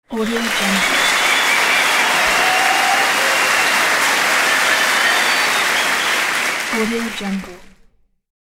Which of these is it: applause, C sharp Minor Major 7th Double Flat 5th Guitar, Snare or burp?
applause